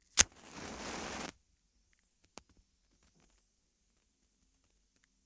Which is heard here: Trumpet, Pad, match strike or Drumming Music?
match strike